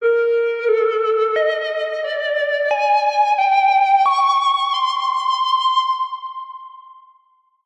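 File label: science fiction 50s style synthesized accent aliens weird theremin; zapsplat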